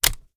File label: kilo98 raise first safety; viper